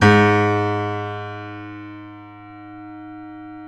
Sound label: PNO FF; Synclavier Sampler Library